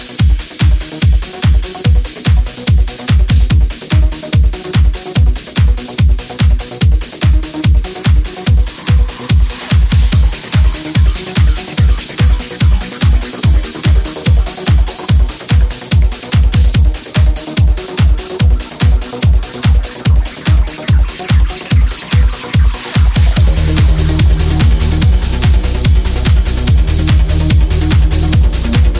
Trance Music

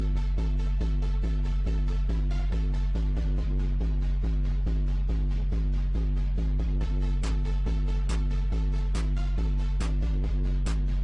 Techno; Thizz

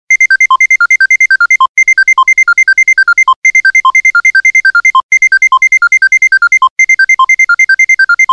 New Msgs

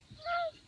Lynx meow

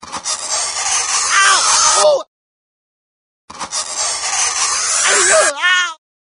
Chalk Board Sound

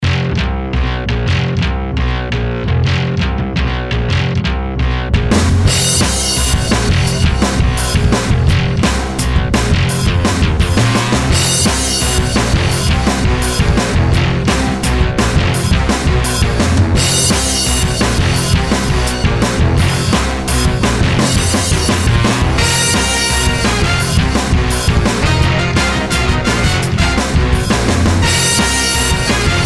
Bit Crusher

